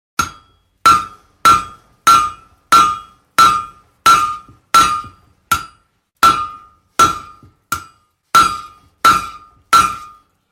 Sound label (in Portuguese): construcao